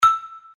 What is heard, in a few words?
dial tone marimbas star; Oppo R7